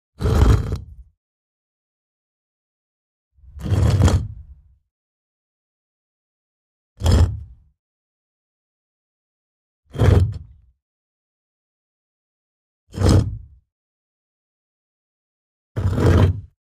Stone, Heavy Move, Push, Short x6

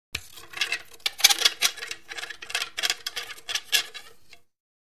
Billiards Unscrewi

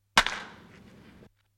Baseball Bat Wood Hi; baseball fx & games

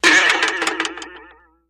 Boing Box Sharp Vib

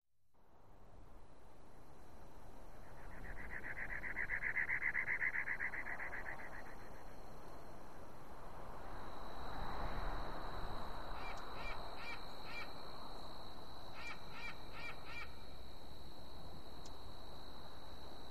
Desert Sandstorm

